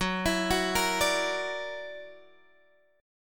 Gb Augmented 7th, down, Guitar, Open G

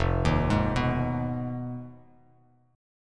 F Minor 7th Flat 5th F down Bass Standard; Fm7b5 arpeggiated; JGuitar

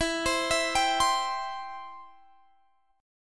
E-Minor Sharp 5th, Guitar, Drop D; x,19,22,21,20,20

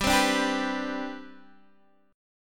C Major 7th Suspended 2nd G, chord

